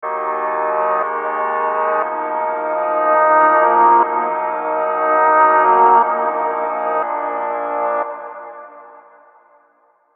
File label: Harmonic Minor Prog; Unison Harmonic Minor Advanced Scales MIDI Collection